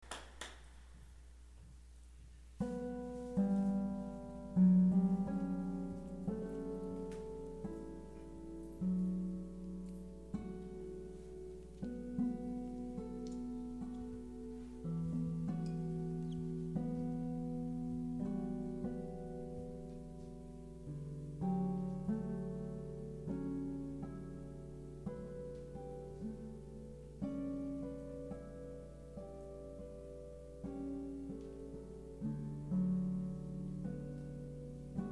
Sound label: Light in the Rainforest, harp